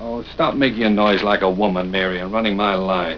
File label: woman noise